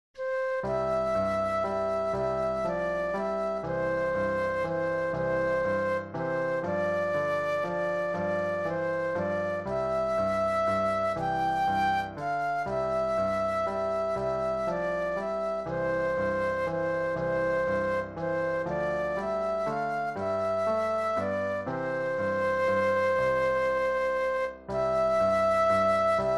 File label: Flute Solo; christian solos